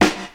old school smooth hip hop snare sample D# key

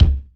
raw kick sample c sharp key; kick drum samples

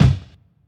subtle reverb bass drum one shot c sharp key

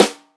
studio steel snare drum sound D key; snare drum samples

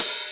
crisp open high hat g sharp key; open hat samples

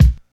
short bass drum single hit b key

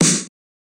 airy snare drum sample e key

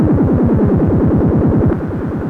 monodelay G sharp m 119, synth loops

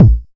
solid bass drum sample d key

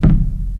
70s subtle reverb kick sound a key

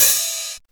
airy sizzle open hat sound g sharp key; drum single hits one shots; Open hi-hat one shot samples

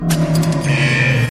mothership alarm

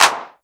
clap sample c key, tr-909 claps

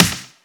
juicy snare drum sample e key

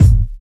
tight bass drum one shot f key; kick drum samples

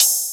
short open hi hat g sharp key; Duration 0:01; open hat samples

